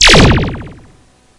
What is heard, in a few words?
laser zap